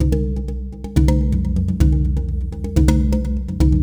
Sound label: 125 Dance Perc; Pro Tools Essential Loops; Dance DJ; Percussion